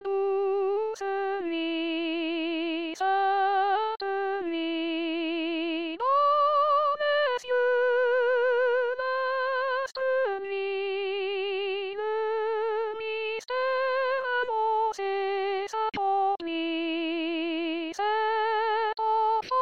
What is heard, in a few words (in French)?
douce nuit soprano